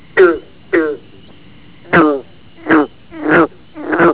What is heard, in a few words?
clamitans, amphibians anura